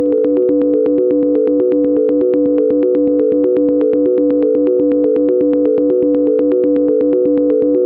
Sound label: 122 BPM Arps Amaj Fmin; Tonic D; Flux Arps; mmb studio melodic